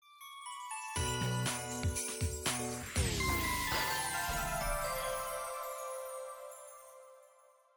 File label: Sweeper; jingles noel; mac do